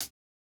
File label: hihat, house